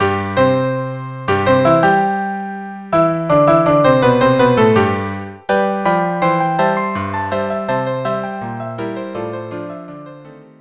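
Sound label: Rag string quartet; Kismet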